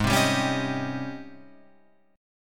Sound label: G sharp Major 11th G sharp, Guitar Open F; JGuitar